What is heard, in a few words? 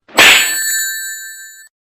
slap; loses rings